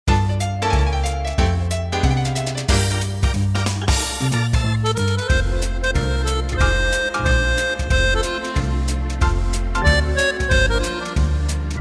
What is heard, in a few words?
piano